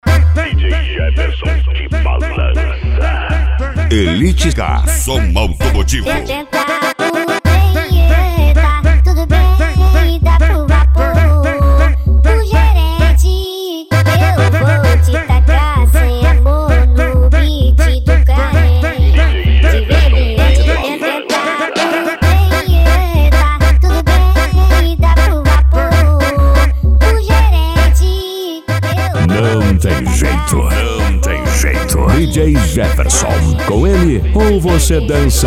som automotivo; elite car

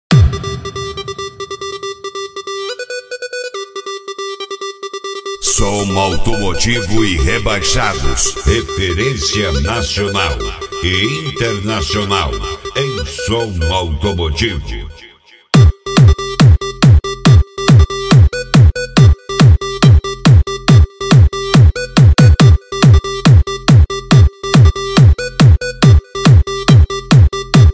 som automotivo; rebaixados 2023

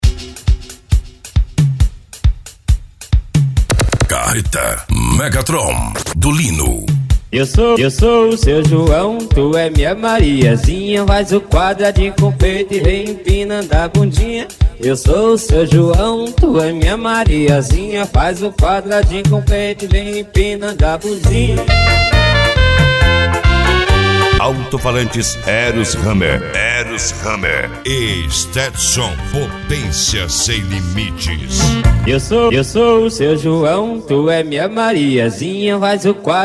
carreta megatron funk mega bass; carreta megatron do lino

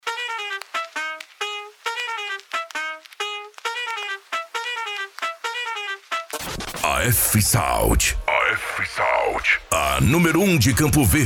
esp funk automotivo; afsound

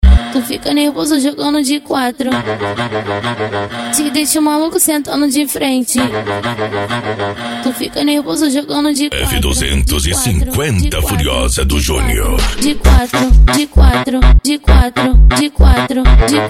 funk e sertanejo

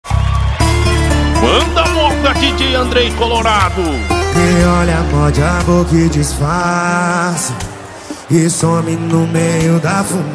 sertanejo com locutores de rodeio

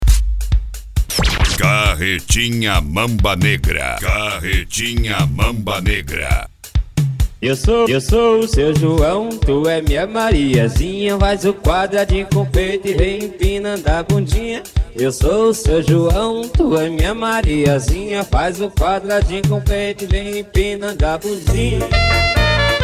carretinha mamba negra esp funk ritmado; jr auto som

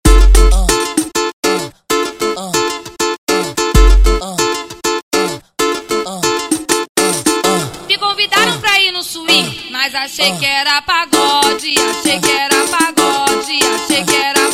carreta suprema funk

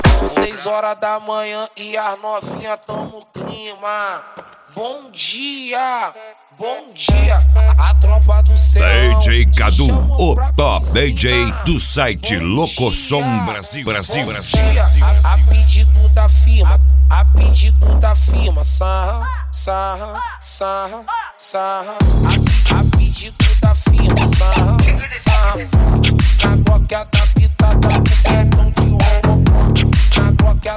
mega funk

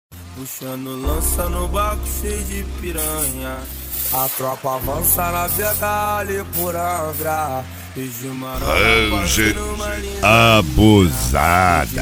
mega funk

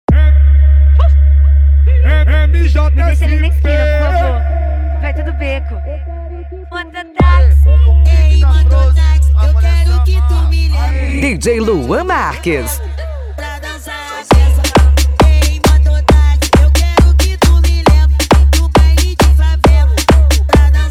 especial funk

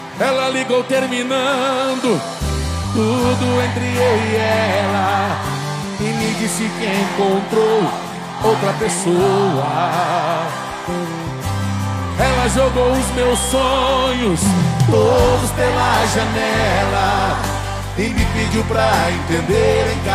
moda de viola; frequency mix

